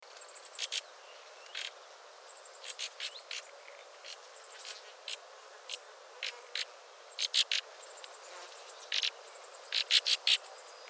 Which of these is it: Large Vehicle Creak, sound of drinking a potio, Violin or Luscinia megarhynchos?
Luscinia megarhynchos